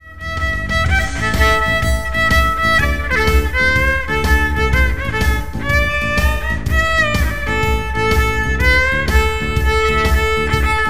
Improv over blues in A; practice takes

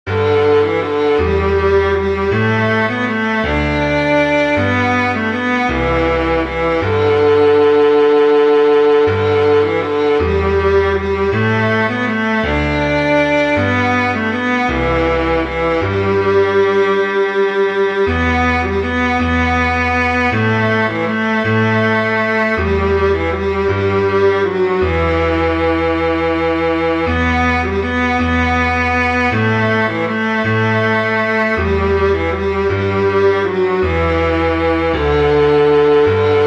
The Skye boat song viola; Outlander

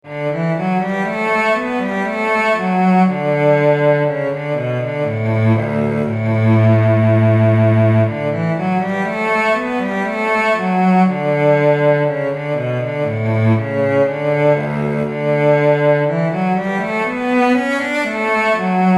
Étude D Major for Solo Cello

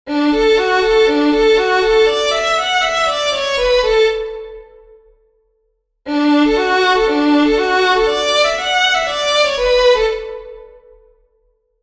swing 8ths

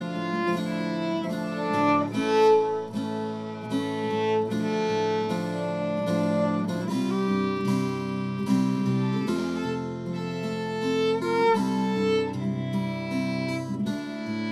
baroque violin

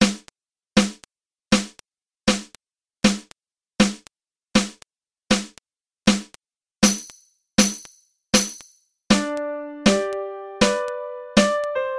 clicktrack 80bpm

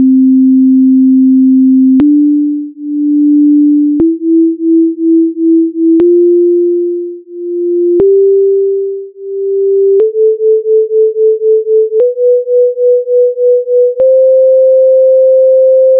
C Major Scale Just Equal; equal vs just

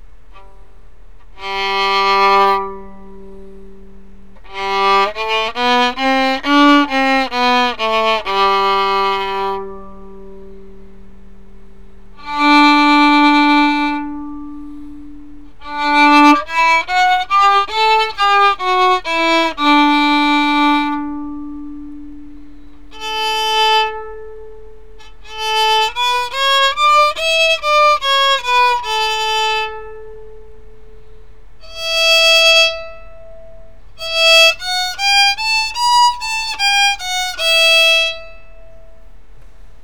MV300 no plastic tubes

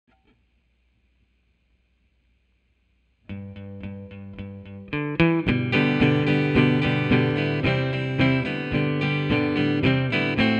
Red Wing Backing Track

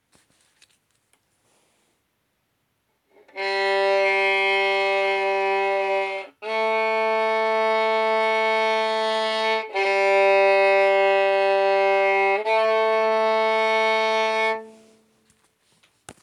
G string

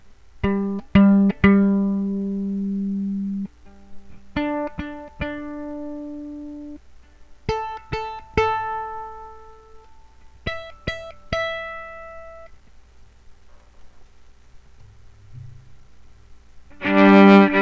Tube pre amp

